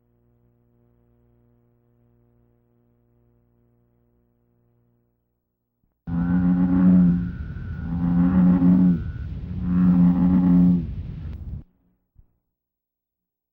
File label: Bull Frog